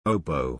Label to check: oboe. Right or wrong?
Right